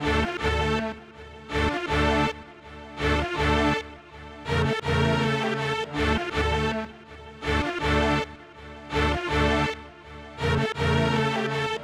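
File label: 081 Hip Hop Strings D minor; Pro Tools Essential Loops; Digidesign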